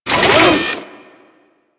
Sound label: Shipyards Robot Arm Large Raise; Ambience; Props; Warframe; Grineer Shipyards